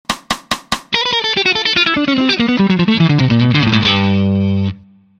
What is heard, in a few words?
Mixolydian Mayhem Lick; Fast